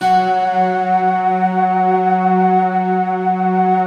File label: HARPS, Harpsiflute, Harpsi Stack; Optical Media International; Sonic Images Library